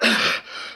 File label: Fatigue Light